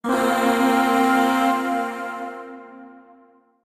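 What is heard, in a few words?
Void Shawzin Pentatonic Minor Chord; Warframe; Zariman; Chords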